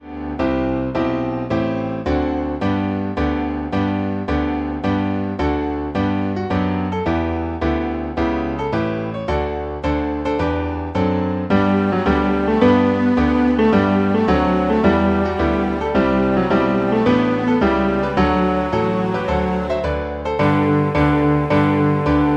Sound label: koinobori bass